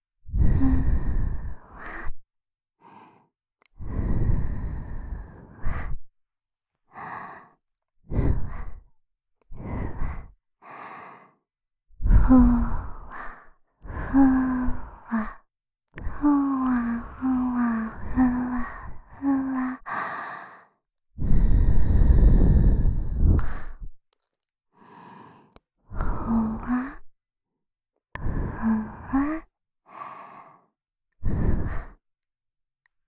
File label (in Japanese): ふわふわ, ASMR